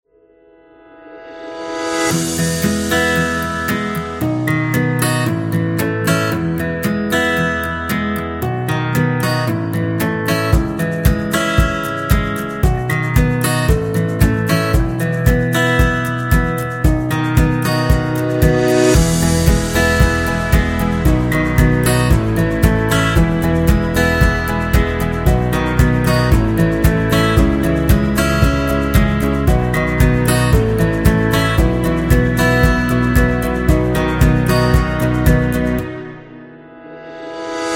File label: Positive Acoustic Guitar; business background